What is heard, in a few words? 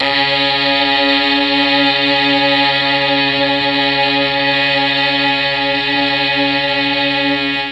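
C#2 Strings Brass, Mellotron; Mike Pinder - Mellotron Archive CD; STRGS+BRASS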